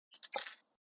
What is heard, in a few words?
Normal Bet